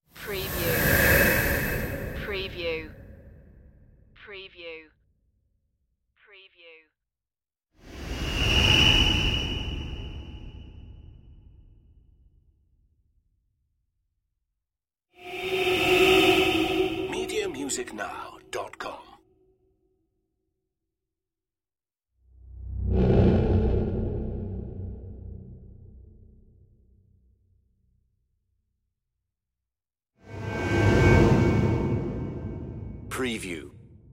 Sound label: space whooshes